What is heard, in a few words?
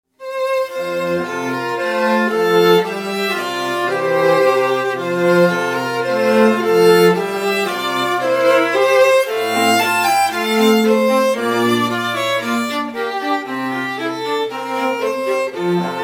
trio aria